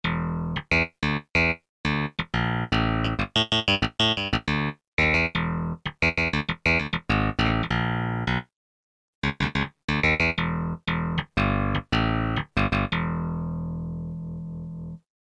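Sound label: electric bass; sampler; Guess the sampler game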